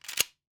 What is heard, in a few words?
Shotgun Open; SoundsCrate